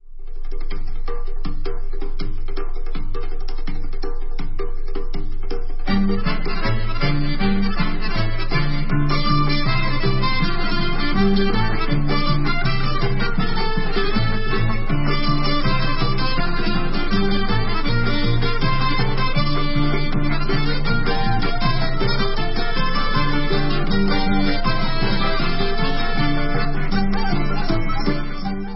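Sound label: instrumental, Israeli Dances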